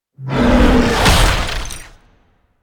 Field Mat Tap; Yu-Gi-Oh! Master Duel

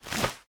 Wing Flap; City

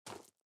SFX run gravel

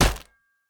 Baseball Bat Hit

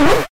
Note Miss; Friday Night Funkin gamerip

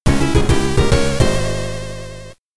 START (YM2203); New City Hero

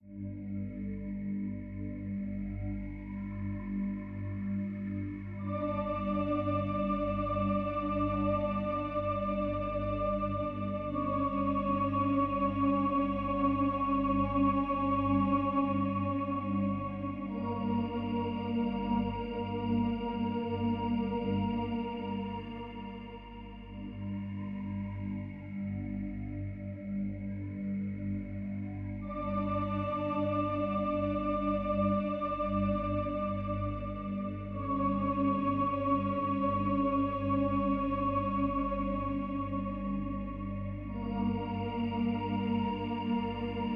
Ambiant

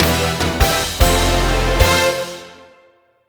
Loading Sting; Angry Birds Transformers

